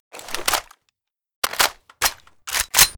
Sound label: sks reload; STALZONE